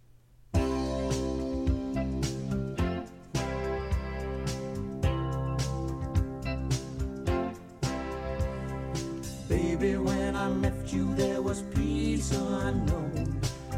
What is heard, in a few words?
High Women; Choir; Harmonies